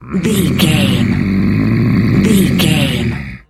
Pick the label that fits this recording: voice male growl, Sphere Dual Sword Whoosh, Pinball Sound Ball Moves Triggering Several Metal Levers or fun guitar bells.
voice male growl